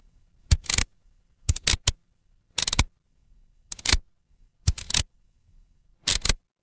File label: mechanical lever